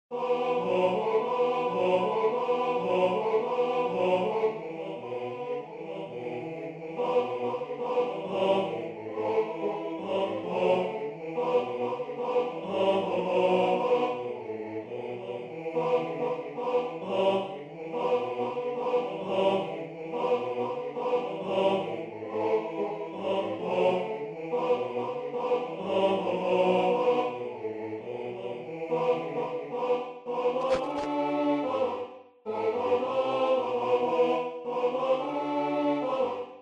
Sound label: tenor; spiderman